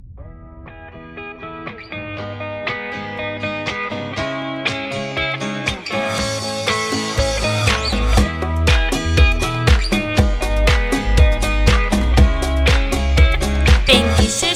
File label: Cuban Cha